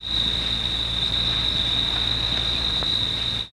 Cicada Night Backgro; cicadas

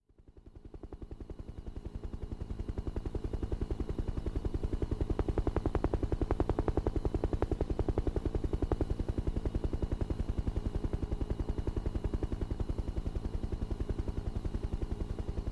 Uh1 Huey Interior Fli

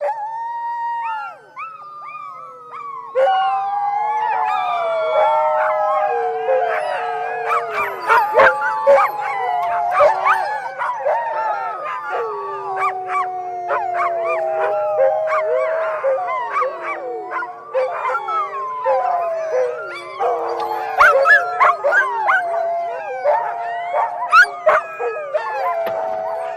Dogs Howling

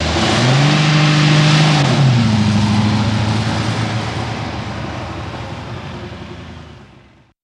Cadillac Revs High Aw

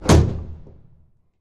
Bolts Var Reverb, latches

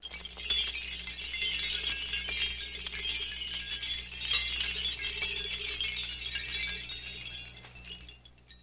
Key Tree Swirls, trees

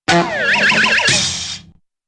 Bulb Horn Bonk Cym, crashes

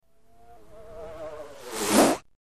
Synth Laser Zap By Pan, zaps

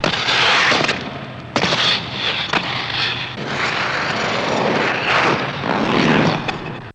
Ice Skates CU Sled Run; ice skating

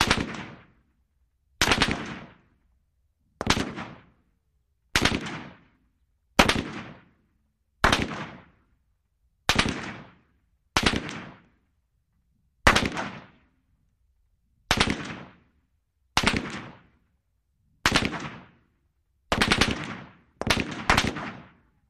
AK47 Double Taps

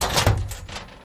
Cabinet Metal Ems Ga

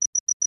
Watch Alarm Beeps, 4 Fast High Pitched Beeps

